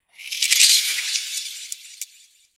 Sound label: magic ice spell cast